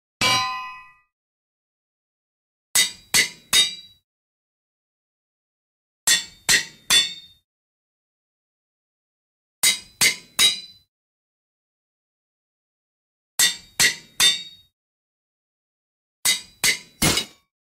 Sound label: Anvil